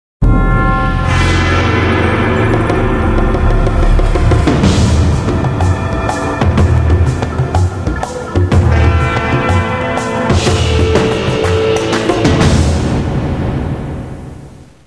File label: Splash; Barrack Unity